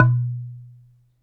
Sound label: Wooden Bar Strike